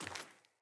Sandals Walk Rock, footsteps; Player

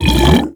Grendel Devour Regurgitate; Warframe

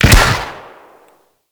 Grenade launcher Shot, Weapons; Warframe; Corpus

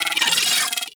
Arachnoid Micro Vocal Attack; Warframe

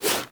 Wall Jump Foley; Footsteps; WallRun; Warframe; Characters; Tenno

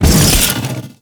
Jump, Foley; Warframe; Sentients; Erra

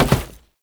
Step Glass, Foley; Warframe; Oraxia

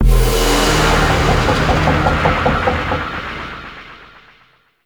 Void Dragon Ring Correct; Enemies; Gameplay; Warframe; Duviri

